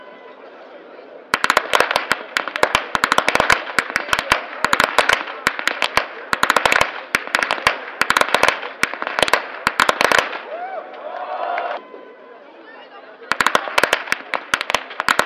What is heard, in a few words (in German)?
schnalzen, flachgau